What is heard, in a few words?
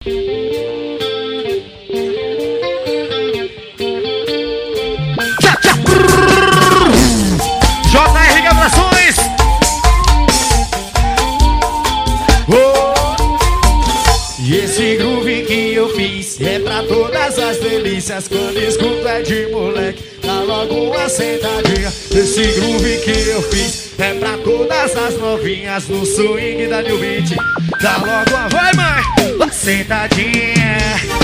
Banda